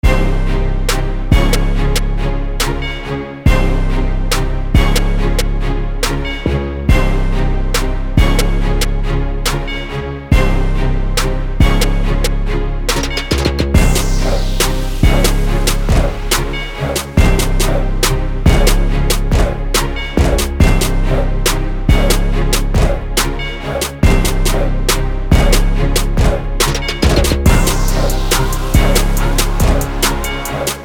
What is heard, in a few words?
Dirty Hip Hop Trap Beat